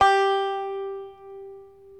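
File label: reference pitch banjo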